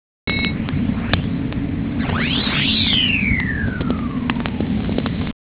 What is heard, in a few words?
whistler, vlf; Stanford